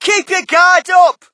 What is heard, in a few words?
crowd, shout; Mike Tyson Heavyweight Boxing